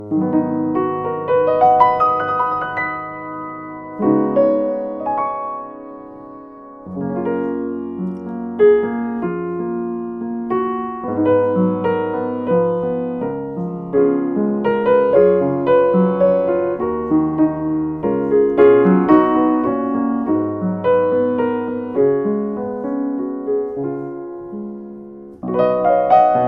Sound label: Yamaha B3e, piano